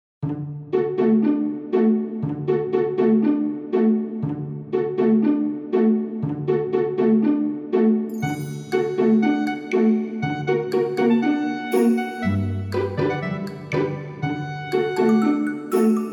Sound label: music